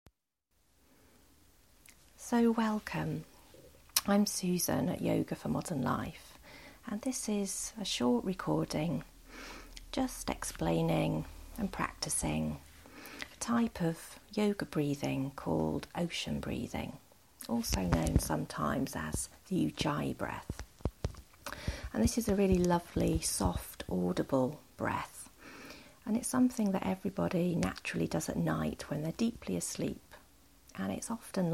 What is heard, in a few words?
Ocean Breathing, Yoga; Yoga For Modern Life